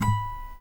ACSSFTFNGR, Guitar; 90 s Sample CDs; Quantum Leap - Guitar & Bass

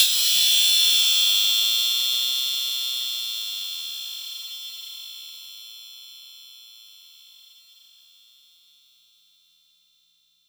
Metal

